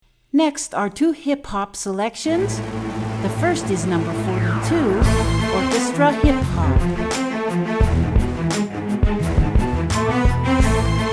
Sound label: Orchestra Hip Hop